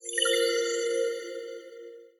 presentation title

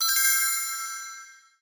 decision, button

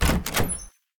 door open

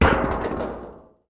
drum fall

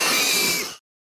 pterosaur cry

